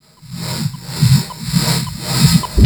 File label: NOISE REV; USB Soundscan vol.27